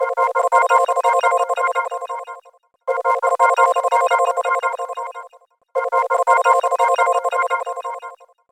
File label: Shaker